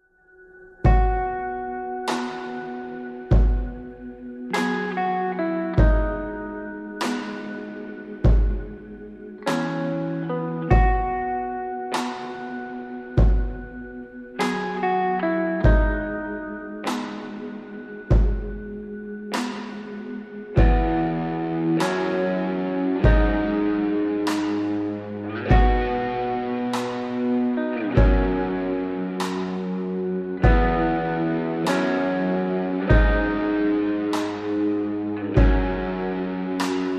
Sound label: Ambient Mix (Less Percussion No Vocals)